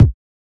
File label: Kicks